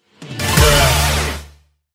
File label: "bruh" eurobeat remix; bruh sound effect 2